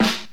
2000s sharp hip hop snare a key, Royality free steel snare drum sample tuned to the A note